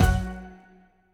Near Win Reel Bounce; fruitshopmegaways

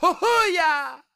medium Win Grito; colossal pinatas

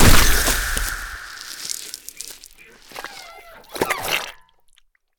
big Win Bat Smash

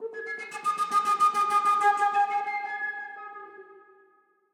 bird Flute; templeofnudges

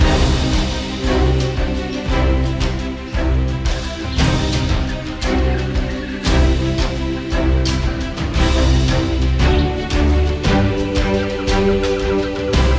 ambience free spin; jumanji

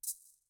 keypad button click; colossal pinatas

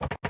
reel start; whos the bride

